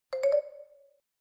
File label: Xylophone Shrt Ascen, mallets xylophone